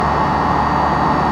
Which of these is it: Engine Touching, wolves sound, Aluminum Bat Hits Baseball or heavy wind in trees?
Engine Touching